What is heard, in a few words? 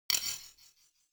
abstract percussive drumstick hit clank soft